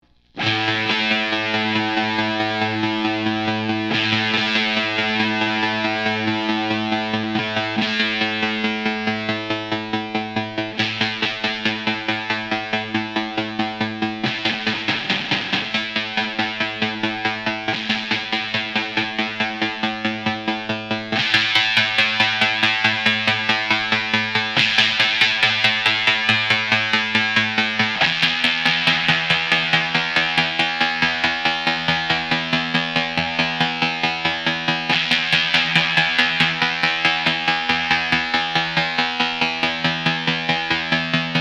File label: flender + trem-o-matic w- dearmond2k pups; ronsound